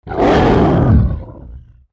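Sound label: ATTACK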